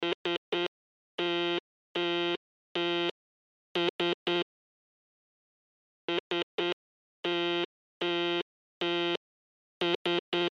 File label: Morse Code sos Twice Emergency; Blastwave